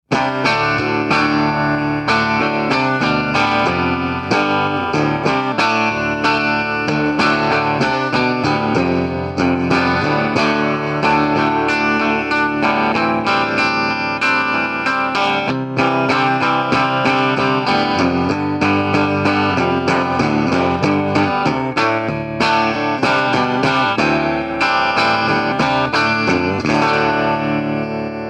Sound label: Gretsch